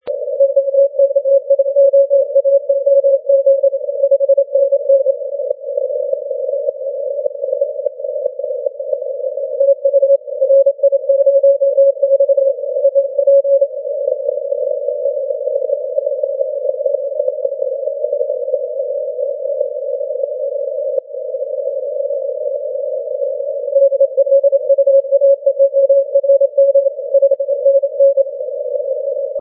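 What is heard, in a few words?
20m Morse code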